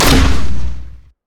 smoke grenade; LoL; graves

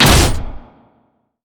axe grab bump oh; Darius

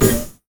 prismatic wave self; LoL; lux

